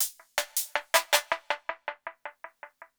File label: PERCUSSN, Ambient, Drum